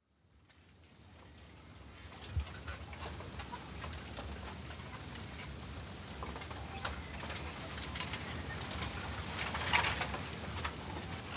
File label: BAMBOO FOREST